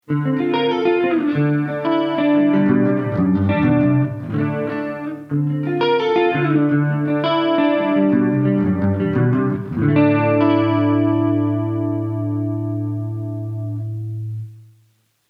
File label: Picking